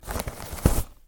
take tissue

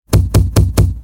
wall pounding; horror

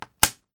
shogi

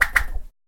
swish; attack

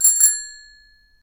bicycle bell

